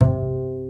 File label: PITZ STRINGS